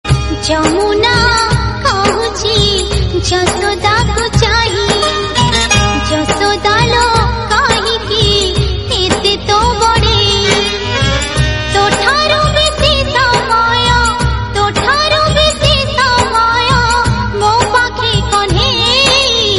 odiya bhajan

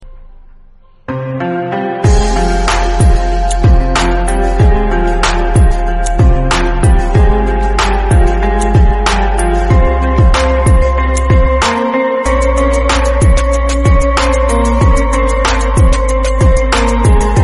instrumental remix, ringtone; bella ciao

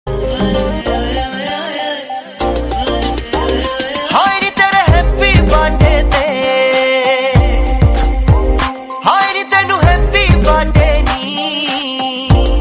happy birthday song, ringtone

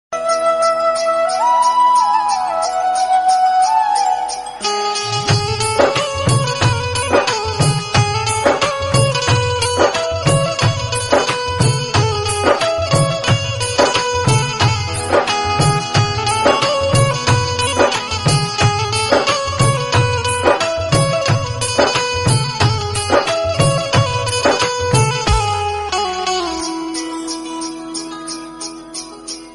melodious instrumental

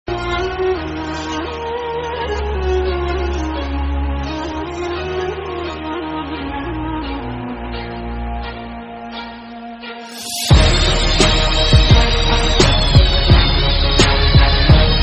heart touching instrumental, ringtone

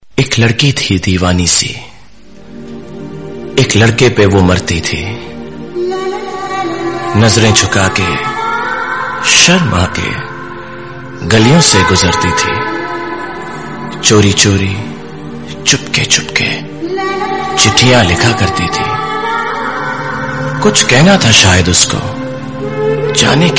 ring tome hindi film

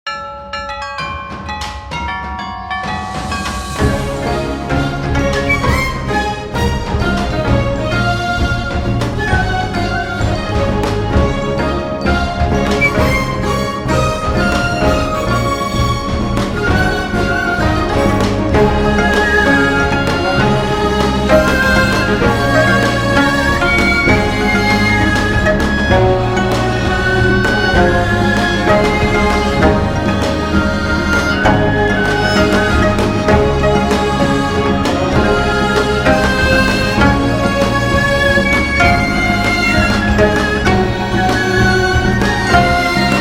samsung, ringtone

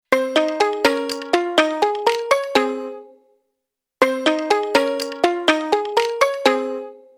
samsung galaxy z fold4, ringtone

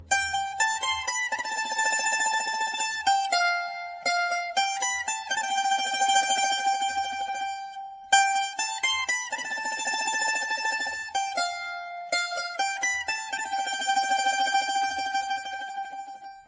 sad theme, ringtone

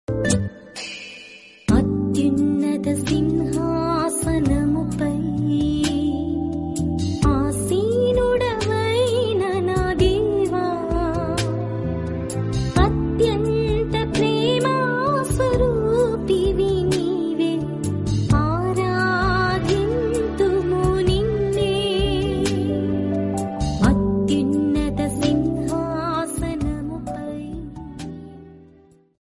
jesus telugu songs

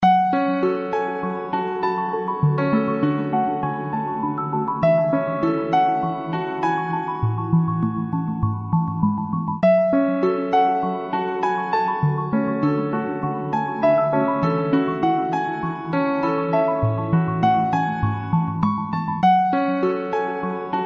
piano

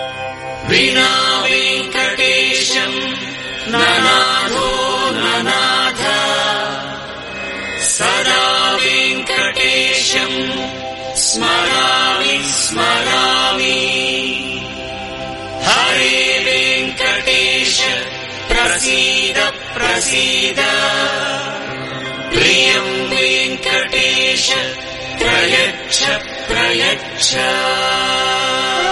devotional mantra

